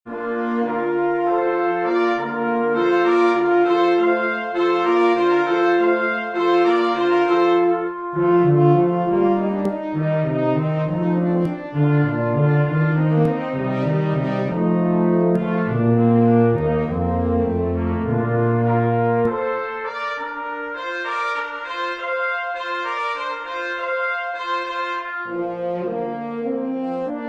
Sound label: watermusic quintet, brass; Water Music "Hornpipe"